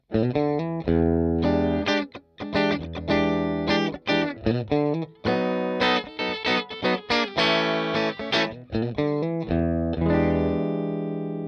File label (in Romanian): Clean Cablu Obisnuit, Clean G50 Cable Tone; Line 6 Relay; wireless pentru chitara de la Line 6